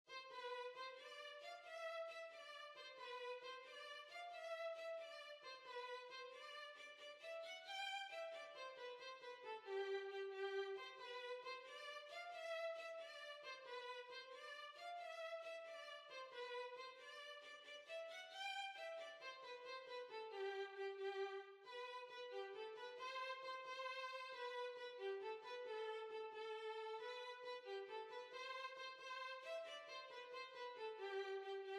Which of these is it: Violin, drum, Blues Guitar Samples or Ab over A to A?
Violin